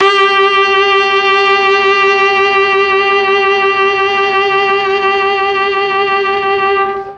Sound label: G3 VIOLA, Mellotron; Mike Pinder - Mellotron Archive CD